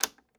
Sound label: switch up